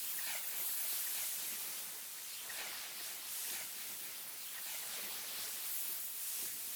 Rage Active Loop; Powers; Fey; Champion

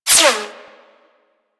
Bullet Whizzby; Warframe; Weapons

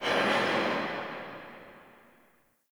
Under City Alley Distant Mechanical

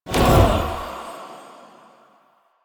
Choir Erupt End; Warframe

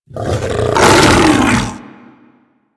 Feral Vocal Finisher Neck Shake Snap; Enemies; Kubrow; Grineer; Warframe

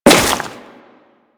Crewman Step Dirt, Footsteps; Warframe; Corpus

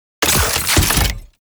Scan Pillar Plant Close; DE; Warframe; Frumentarius; Scan Ability

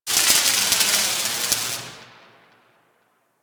Electrical Spark; Ambience; Props; Warframe; Orokin

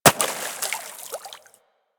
Bullet Impact Water; Warframe; DE; Weapons

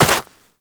Operator Run Snow, Footsteps; Warframe

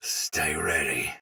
block soldier sword agent male; Dialog; Barks; Battle; Melee; Sinecure

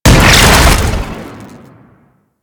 Throwing Taser Sticky Bomb Explosion; Warframe; Tenno

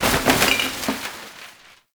garbage bag destroy

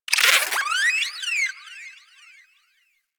Rodent Vocal Captured Lift Away; Warframe; Hunting Critters

